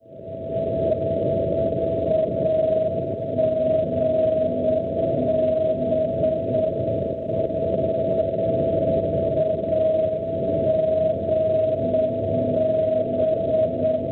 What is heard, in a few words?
NDB Augsburg Germania 318 kHz; AGB; Schwarzsee